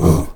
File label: AHOO E 2, STACC. OOS; Spectrasonics; Heart of Africa 1